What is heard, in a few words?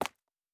Footstep Concrete Running; Character Sounds